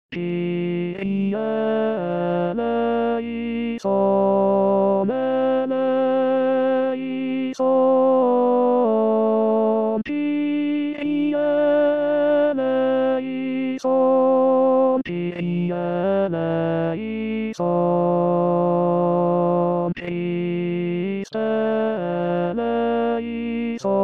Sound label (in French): kyrie tenor; st jean